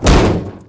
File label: bodyfall; Mafie 1